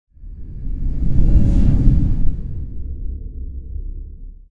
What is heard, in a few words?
Spaceship pass by fast